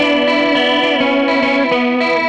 Gretsch Guitar Loop

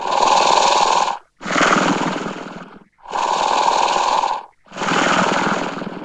Death Breath, Weird; Industrial

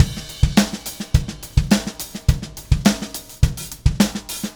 Beat Crash; Session 02 - Clinical

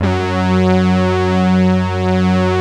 Obie Stack; Optical Media International; Sonic Images Library

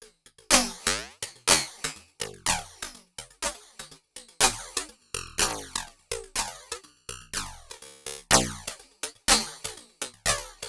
Drum Fx Loop, Electronica; Sonic Foundry (Sony Creative Software) - Electronic Point Blank

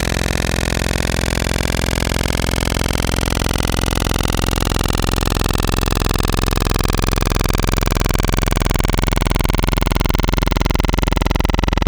Grit Saw, Wavetables; Antidote Advent

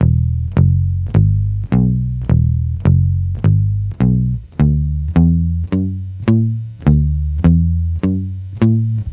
Multi Guitar Swing Bass-E, 105; Zero-G Kocktail Kollection; Construction Kitz (Lounge)

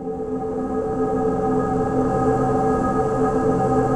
THROATY; Pro-Rec - Synthsonic; JV FILM

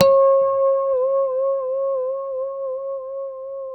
D5 pick harmonics, guitars; Spectrasonics; Hans Zimmer Guitars VOL-1; JC-HARMONICS

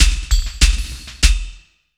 Industrial Loops, WAGON; 98 WAGON

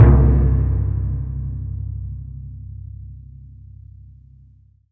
C1, synth; 90 s Sample CDs; NiceBeats; SynthLine VOL-27

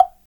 SLIT, WOOD; Spectrasonics; Heart of Africa 1; AF.WOOD

